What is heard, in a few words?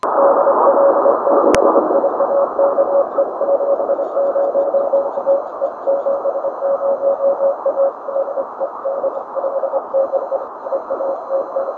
432MHz